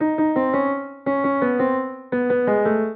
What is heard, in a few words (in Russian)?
fortepiano sozdayushee napryazhenie